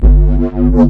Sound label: techstep bass; single samples; Basses